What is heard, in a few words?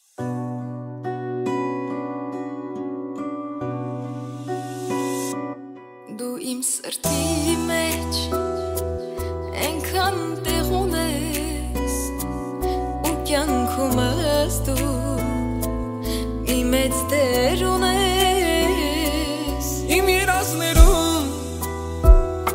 haykakan music